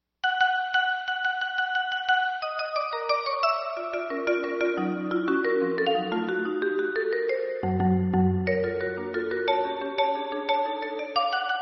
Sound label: marimba fantasy; Scott Blasco; four-mallet